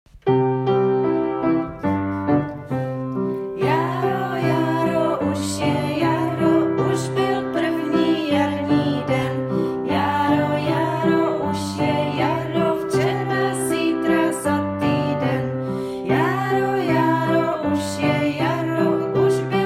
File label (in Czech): jaro zpev; S Puntíkem a Pepinou za vílou Poupěnkou; barevné velikonoce